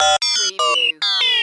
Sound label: Data Glitch Readout